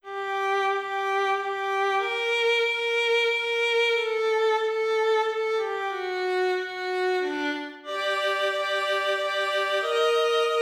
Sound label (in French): melodie